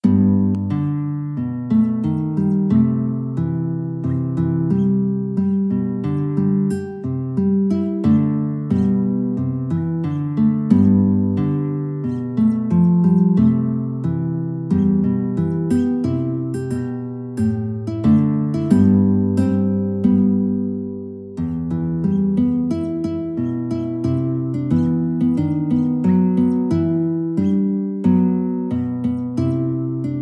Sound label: guitar